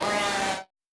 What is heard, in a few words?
Malfo Syn, malfunctioning synth